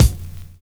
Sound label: NOISY TRE; Univers Sons